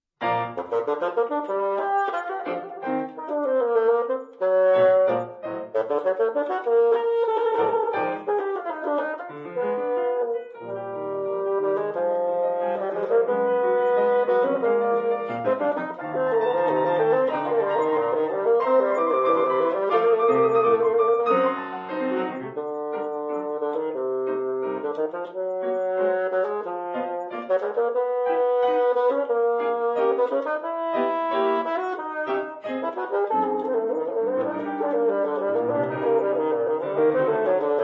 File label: Bassoon Sonatina